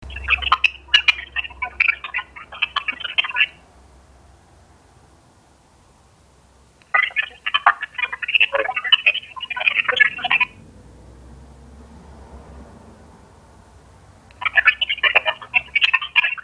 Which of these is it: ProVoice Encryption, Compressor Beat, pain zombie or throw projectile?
ProVoice Encryption